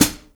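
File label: hi-hat L, Drum & Bass 180 BPM; Ueberschall; Drum & Bass Flash Trackz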